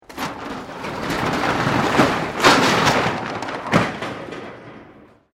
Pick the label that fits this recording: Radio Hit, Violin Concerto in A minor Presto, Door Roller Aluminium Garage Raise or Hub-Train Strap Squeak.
Door Roller Aluminium Garage Raise